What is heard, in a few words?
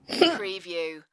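Female Muffled Hiccup; Human Sounds / Other / Misc Sounds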